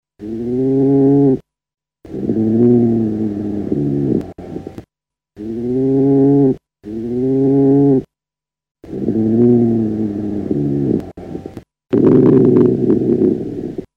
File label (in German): Dachs